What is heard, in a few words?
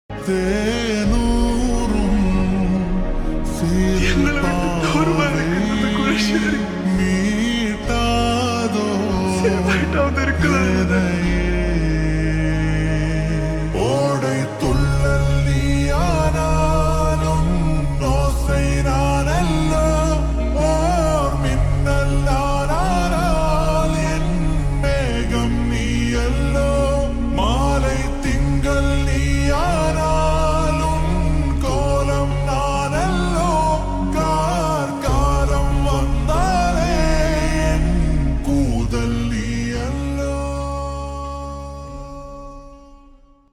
Slowed Reverb; tamil; amaran